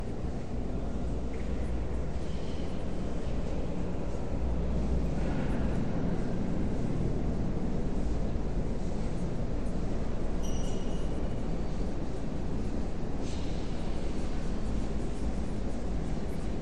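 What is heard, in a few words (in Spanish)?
Ambiente iglesia, church ambience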